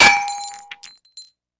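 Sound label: precise single shot into a tin can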